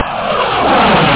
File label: jet; da capo vol1; effects whoosh